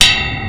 Metal Railing Hit

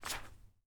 Page Turn

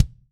Wicked Acoustic Kick

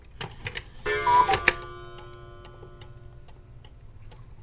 Coocoo Clock Half Hour

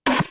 M16a2 With M203 Fires A M406 He Round

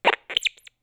Cracklebox High Bloop

